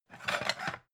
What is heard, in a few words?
pan take slide; objekte; topf